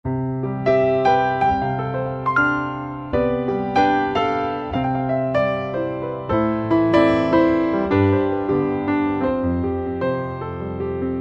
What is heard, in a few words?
Under The Sun piano